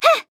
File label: Grunt Attack; Ying